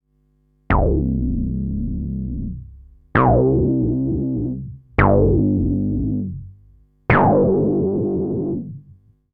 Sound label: CHORUS BASS COTK Model 15